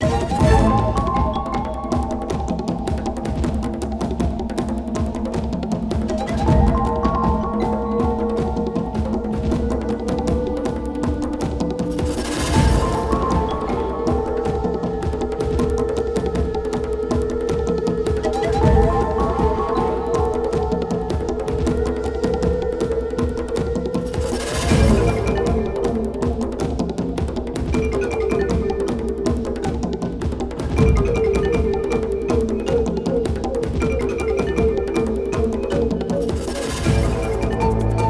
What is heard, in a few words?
Music Ingame Boss Giant Pteranodon Loop; Boss 02ab